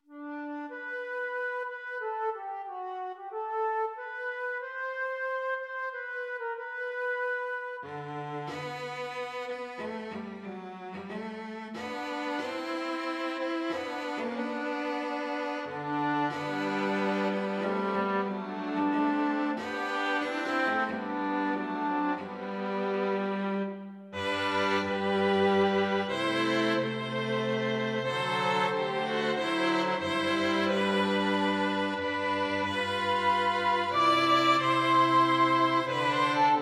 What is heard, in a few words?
INSTRUMENTAL; Shine On